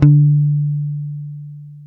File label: D 4, go-go mute, bass; Spectrasonics; Bass Legends CD 1